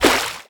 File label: Idle squish